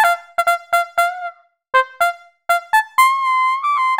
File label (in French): militaire, arturia brass